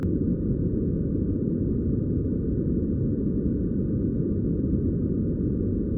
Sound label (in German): braunes rauschen